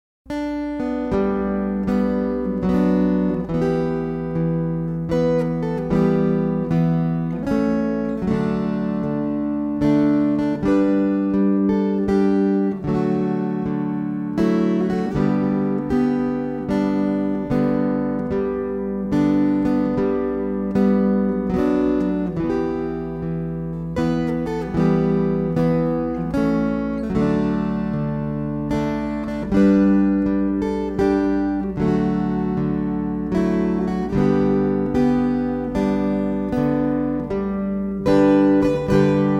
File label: Waltzes